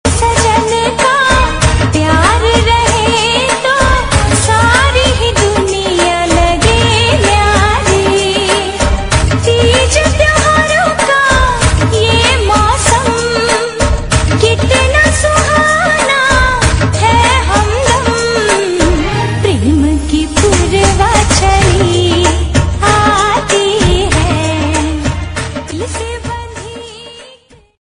teej song ringtone

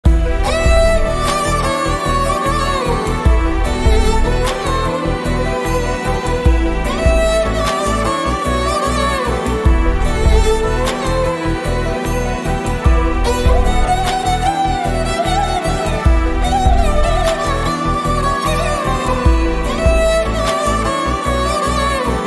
Tamil Ringtone; Jai Bhim

